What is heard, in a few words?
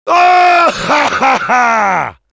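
laugh happy, French; Demoman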